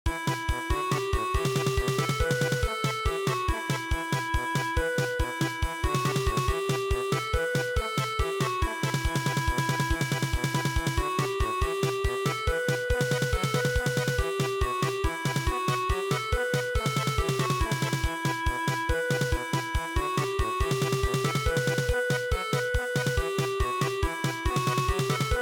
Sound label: Garba Dance Song German Polka